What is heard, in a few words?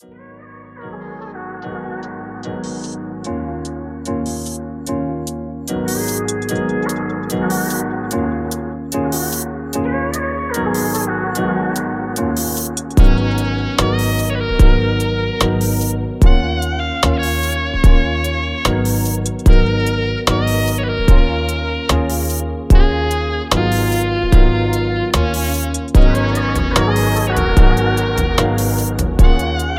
Sweet Wind LOFI MUSIC